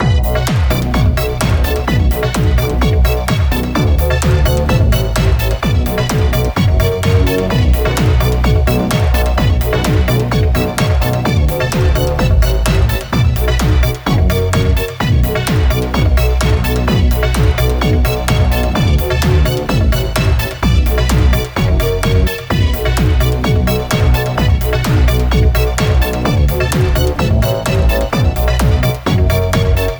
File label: synthmaster one; wonderland 3